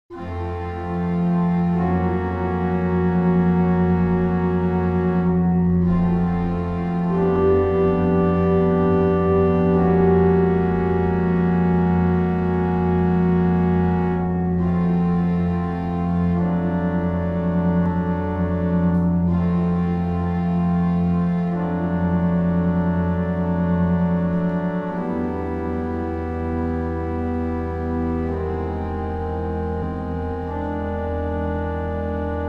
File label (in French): gambe bourdon reverb